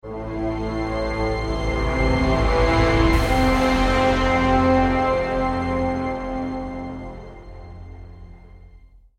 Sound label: Death; Marlow Briggs and the Mask of Death